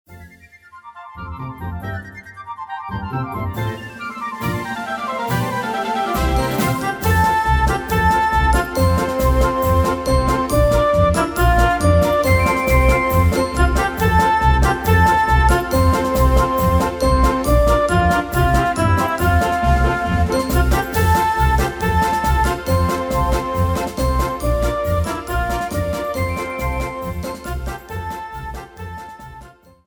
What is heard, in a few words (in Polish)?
wersja instrumentalna